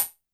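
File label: Hi-Hat Open Synth Short H; YAMAHA RM1X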